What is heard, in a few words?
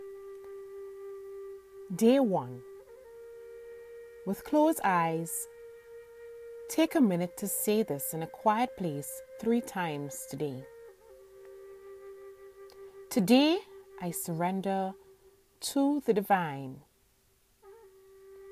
healing mantra